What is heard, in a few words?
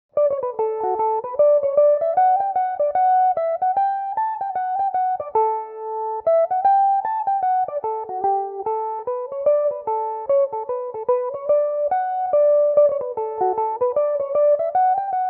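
d major, mandolin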